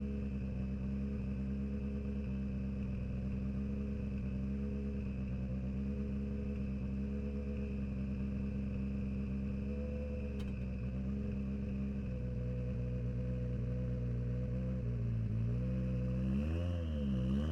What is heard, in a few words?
Citroen DS3 WRC - ONBRD - RAMPS - Drive Stop - EXHAUST; Pole Position; Citroen DS3 WRC 2012; MKH8040